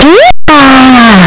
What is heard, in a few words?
pikachu cry; pokeyellow